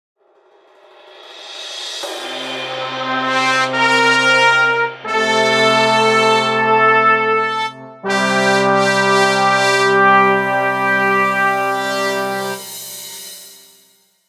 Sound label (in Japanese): ジングル; 天空の城